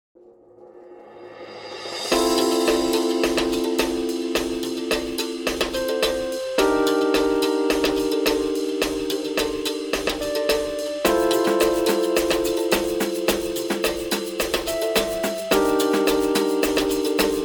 Techno Trance Sample

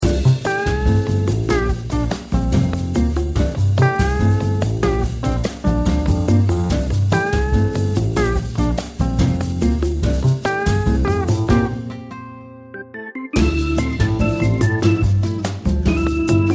busker

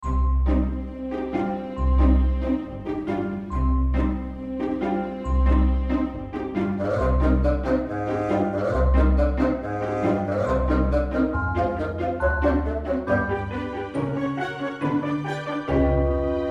Circus Cat, royalty-free music; Make it Magic Clowns

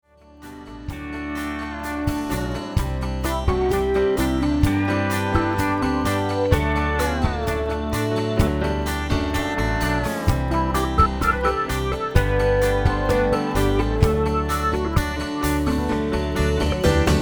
Electric Cowboy

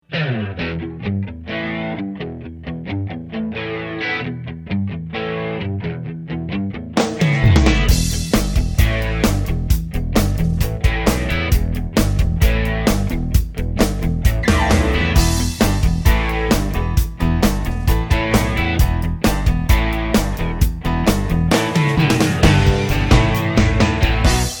Rocket Balloon Ride

